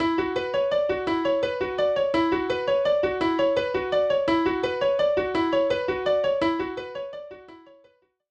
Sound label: Minimal music Piano Phase Pattern